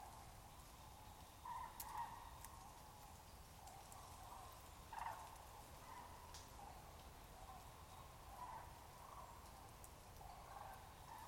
Grue cendrée

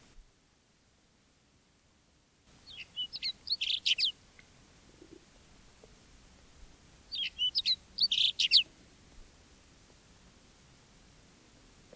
Accenteur alpin Allos

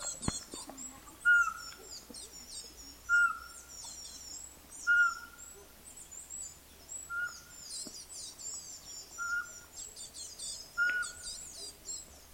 Chevechette; devoluy

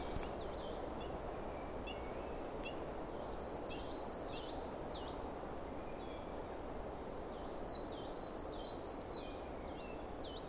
Mésange noire, Corse; Venturon corse; Vizzavona

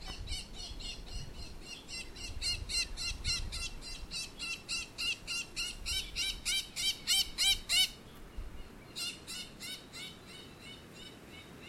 Perruche de Maurice

